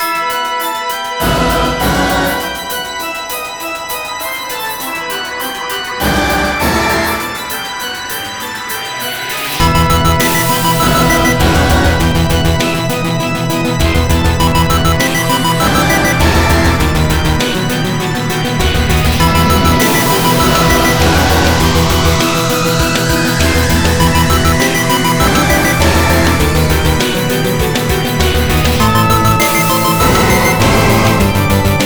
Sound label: bgm dungeon